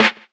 snare one shot slapback

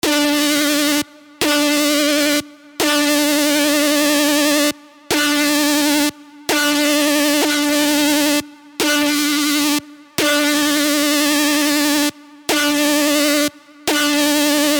Hybrid Layer

